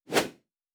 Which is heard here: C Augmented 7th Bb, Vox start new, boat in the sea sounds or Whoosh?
Whoosh